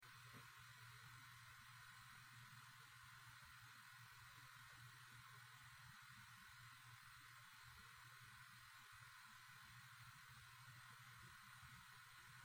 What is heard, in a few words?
hitachi 7k1000